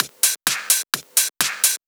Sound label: Clubhouse Loop 128 BPM